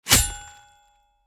fantasy game sword cut sound effect

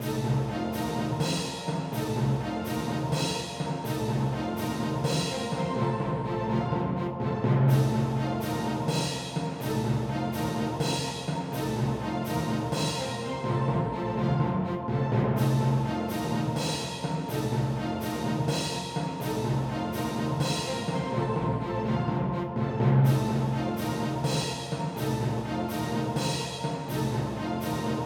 dramatic fight situation; Adventure Music; FREE Adventure Music Pack

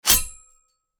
fantasy game sword cut sound effect

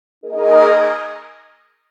going to the next level

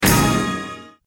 Character Level up

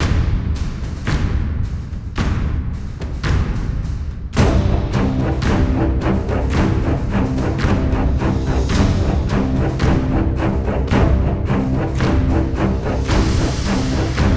110bpm LOOP; Epic Combat Music; 52s; LogLog Games; Epic Combat Music Pack

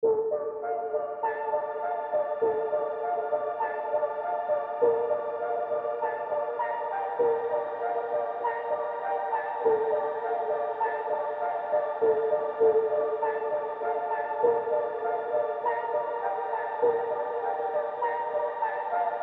hope cinematic loop

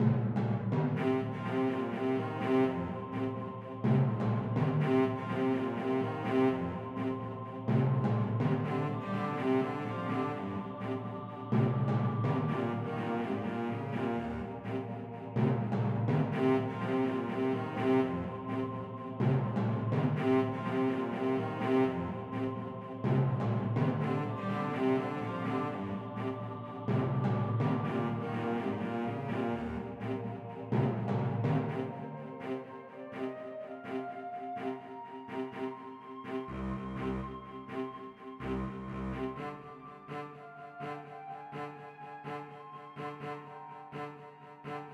heroic build up; Adventure Music; FREE Adventure Music Pack

